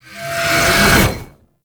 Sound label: Uriel Brimstone Cast Riser; Warframe